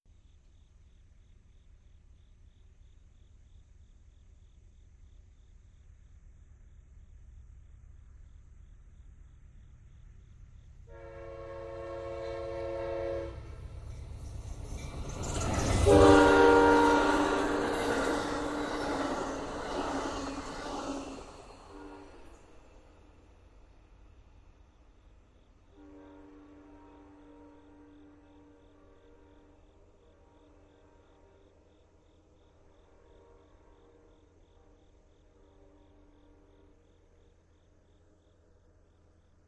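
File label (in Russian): гудок состава поезда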